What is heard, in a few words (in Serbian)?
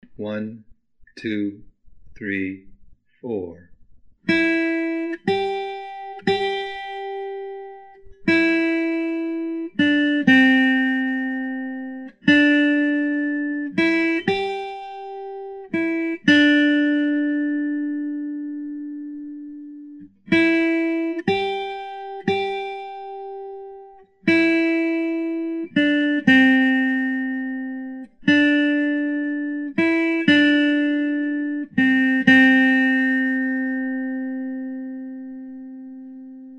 Largo, Gitara